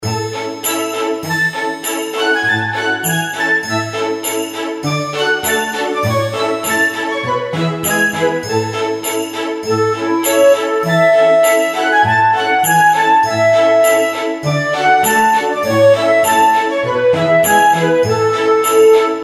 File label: nutcracker intro bgm; The Nut Cracker